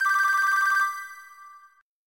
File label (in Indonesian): Nada Dering Nokia Jadul Urgent